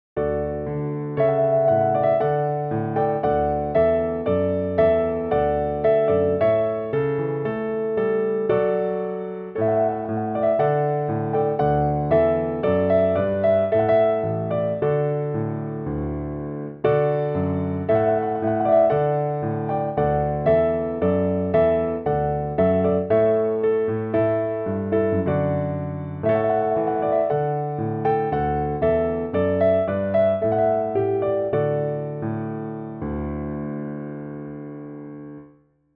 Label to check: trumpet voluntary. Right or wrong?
Right